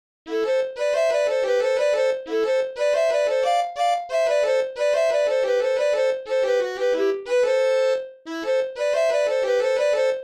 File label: jig